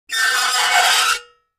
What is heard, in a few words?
Metal Scrape On Metal